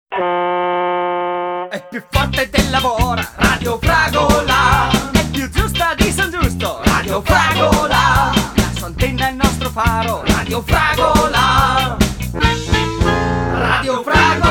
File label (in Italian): jingle radio; fragola